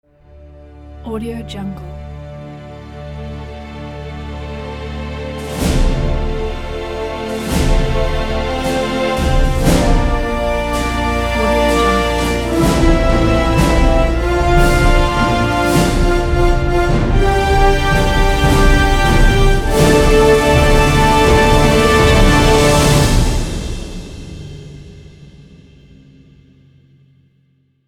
winner epic fanfare ident

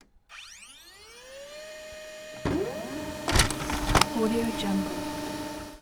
printer

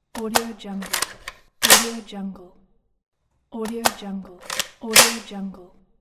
assault rifle; amethistlab

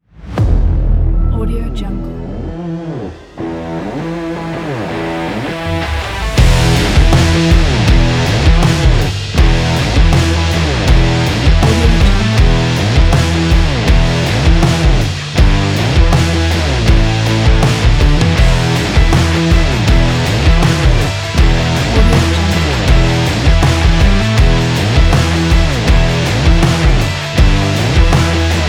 energy rock; edrecords

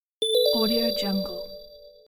bonus available

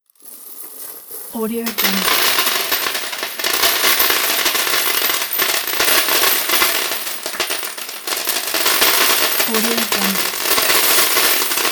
coins spilling

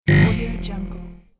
futuristic ui electromechanical sound

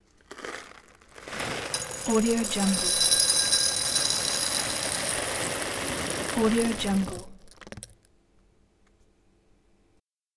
coffee in glass jar

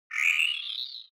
ui classic game start evil

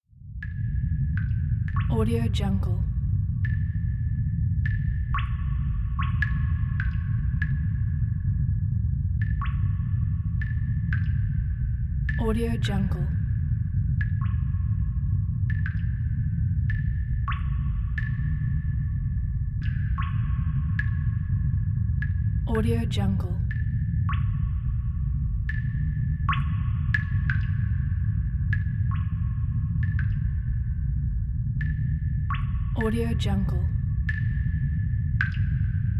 cave ambience

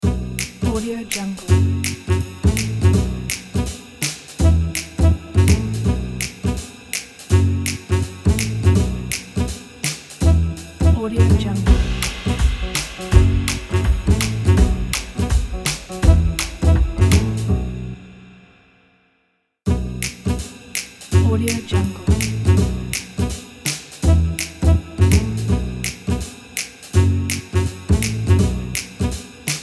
jazz background music kit

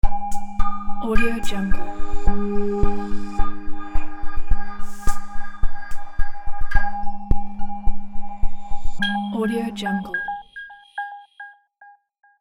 evening news logo

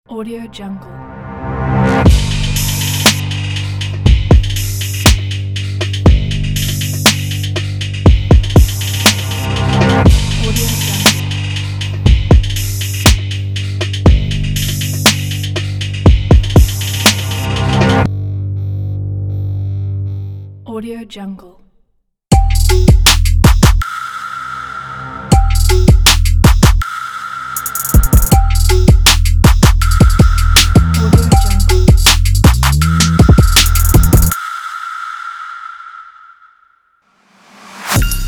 trap logo pack; andromedasound

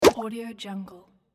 button

